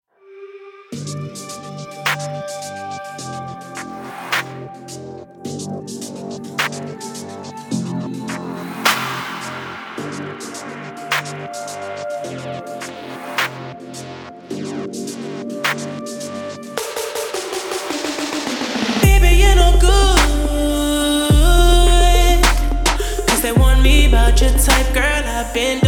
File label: rap